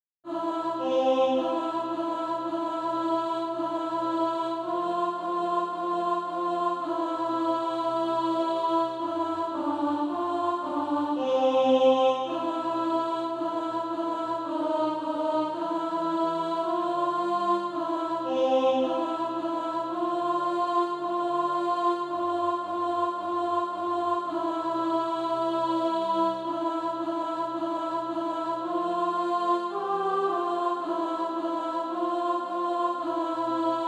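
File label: alto, Practise Music; Come with Happy Faces; SATB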